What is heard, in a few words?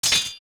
Crystal Switch Off; zelda; OOT